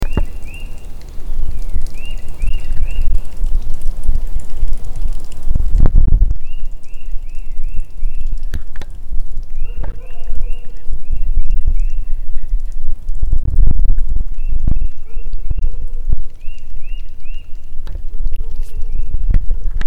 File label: birds; Rhythmic Patterns in New England Landscapes